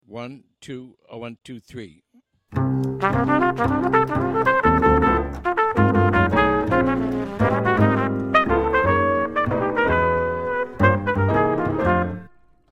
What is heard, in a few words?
MEDIUM minus drums